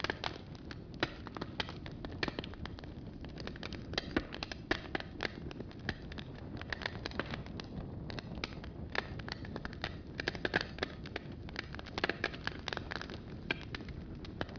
barrel fire

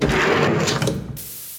door; aesir

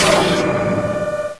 big pump; hydrophobia

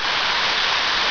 shower; cs valleyspa

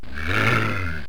roawr

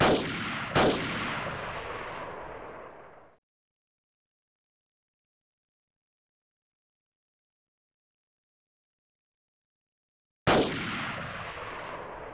sniper

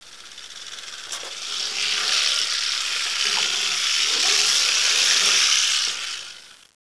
Rattler; copan

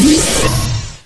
beam

